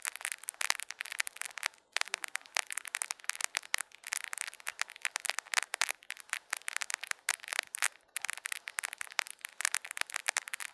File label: drink sparkle; liquid; NS Studios - ultra sound collection